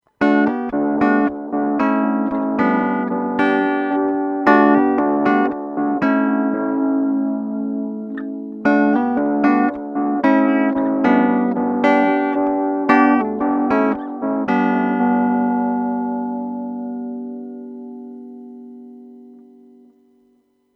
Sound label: Walrus Audio MAKO D1 Vint